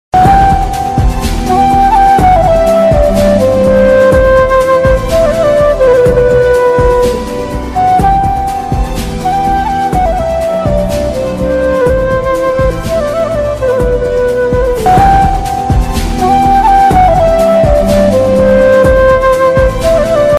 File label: ringtone sound instrumental music background music